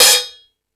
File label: Ambient Rock, Drum Machines